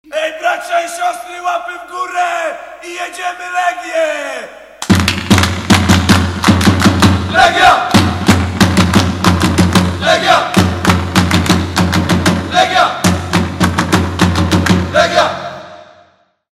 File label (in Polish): śpiewnik klaskanie; legia